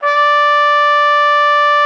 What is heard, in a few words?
TROM D5, TRUMPET; Xsample VOL-3